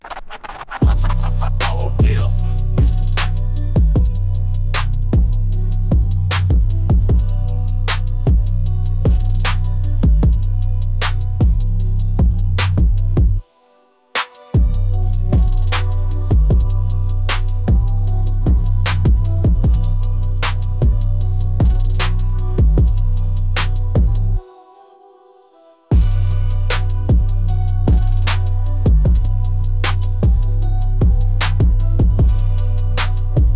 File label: bass ruler, bass music